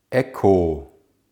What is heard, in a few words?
echo; Buchstabier-Alphabet und Redewendungen SRC – Seefunk